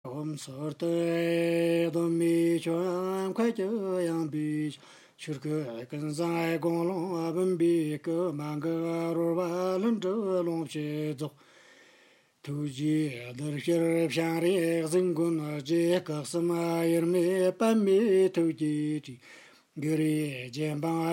Tibetan Supplication; Tibetan 9 Supplication to GNR

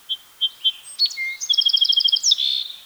Song Sparrow; woods

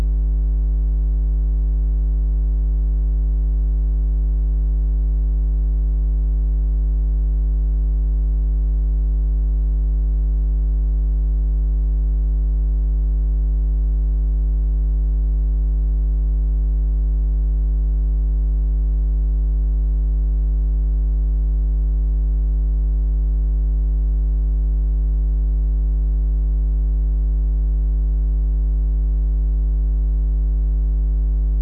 hum

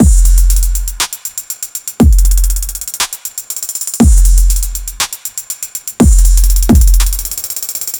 Tight Trap Electronic Loop 120bpm

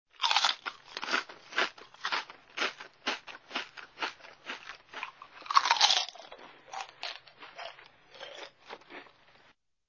eating food; human-activity

